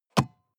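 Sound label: sports car interior compartment close medium; verkehr transport; autos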